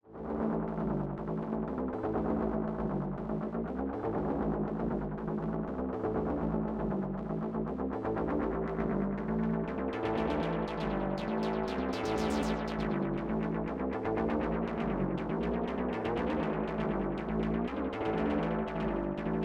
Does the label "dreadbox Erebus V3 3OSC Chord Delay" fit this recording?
Yes